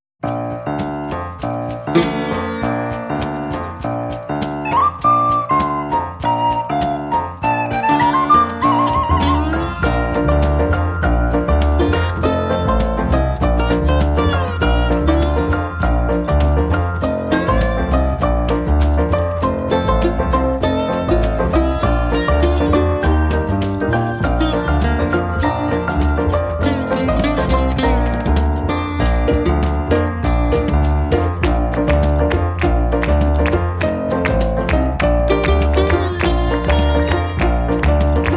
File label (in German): Karaoke Ihr Kinderlein kommet